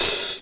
open hat one shot d sharp key, Royality free open hat tuned to the D# note. Loudest frequency: 6654Hz